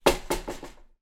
household ironing board lean against wall

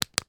household flashlight torch small turn off or on; sound effects one

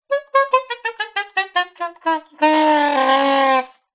Sax Laugh

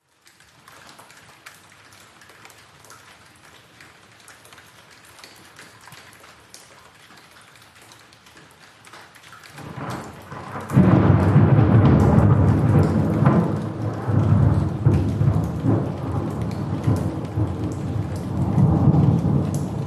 Rain Drippy Close Thunder Rumble; ORTF; PCMD100; PMSFX

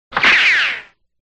CARTOON Ricochet Western; FX; smartsound

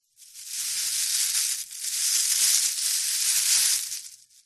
bird nest movement hay bushy; animals

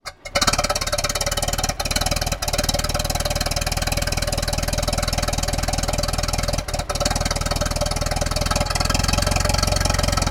transport car model t ford start up drive off; martinimeniscus

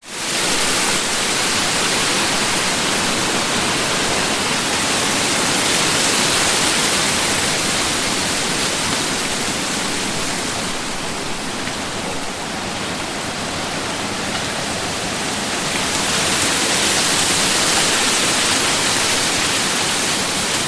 nature wind palm trees distant surf; zapsplat

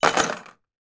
game metal playing pieces drop into box tray; monopoly

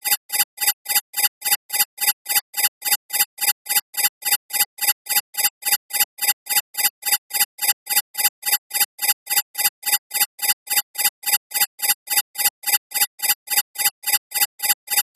science fiction alarm warning digital small fast

